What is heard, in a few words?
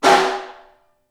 SN2 Far, snare; Ableton Foundation FreePacks; Snare Designer